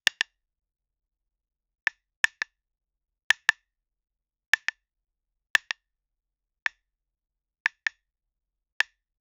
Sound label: woodblock instant attack, wooden